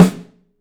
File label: Drums, STEEL; 3D Drums